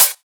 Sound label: hat